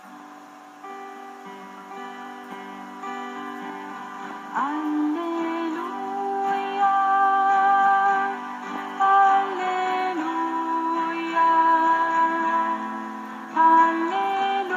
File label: song alleluia